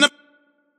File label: Vocal Glitch